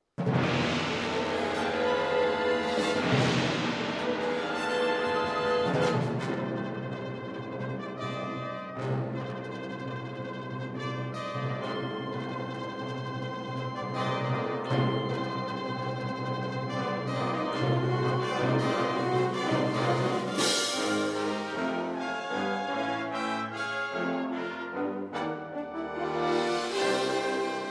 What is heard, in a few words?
Fanfare, Wind Ensemble